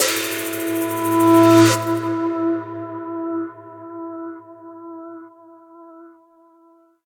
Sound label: Combat Transition Pirate